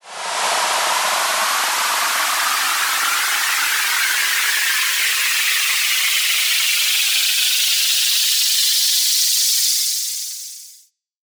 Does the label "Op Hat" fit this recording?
No